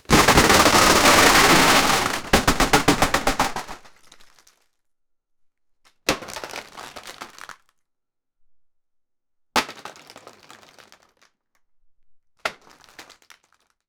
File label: several plastics falling